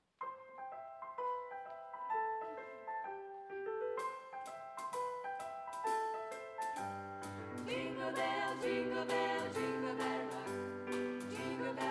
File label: jingle bells